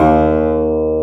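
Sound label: pluck D#2, piano; AKAI S-Series CD-ROM Sound Library VOL-2; PF MIX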